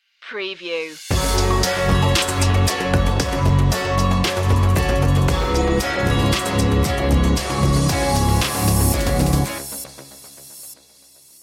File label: windy summer stinger; media music now